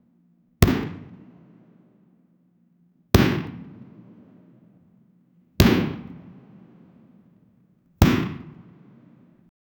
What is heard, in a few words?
big impact of a video, baseball